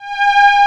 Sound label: SOFT STRING; SAMPLE WAVE MIXING 1